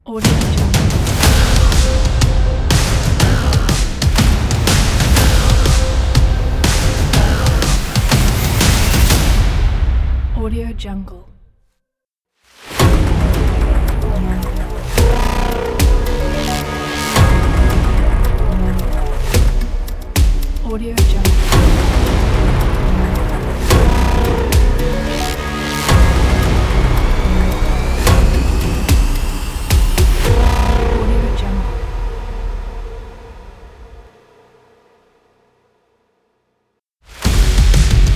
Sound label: the hybrid logo pack